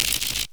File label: Buzzing Power Glitch